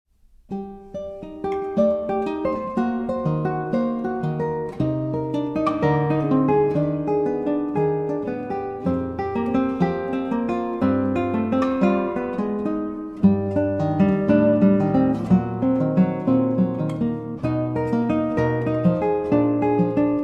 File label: Guitar